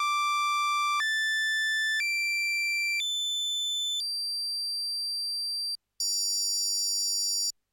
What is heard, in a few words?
MODOR NF-1 Aliasing